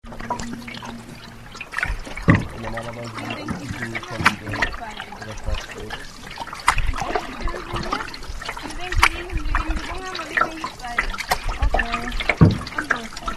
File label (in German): paddeln; schweden 2000